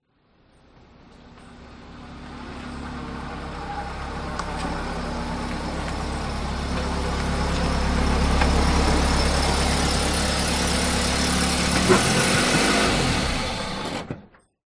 transport car reverse up engine off toyota carolla